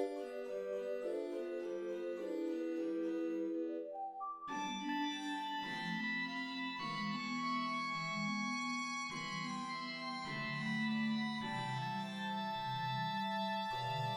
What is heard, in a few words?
ensemble